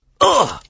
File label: shout man; Jungle-War